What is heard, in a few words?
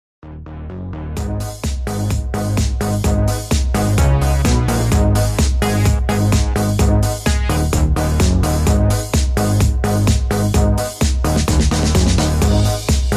Patter